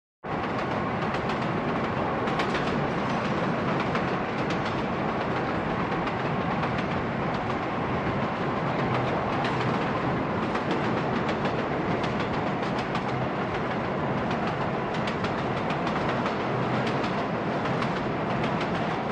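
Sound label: train crash with screech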